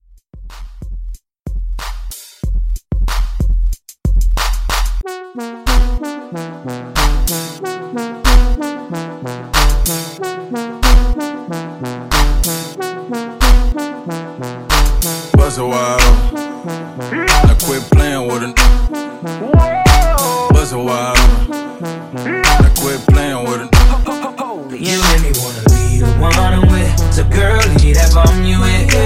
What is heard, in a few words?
Hip Hop RB Redrum; Remixfreakz